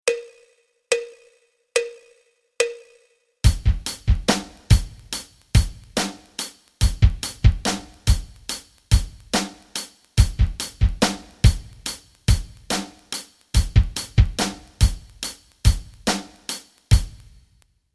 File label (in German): rock rhythmen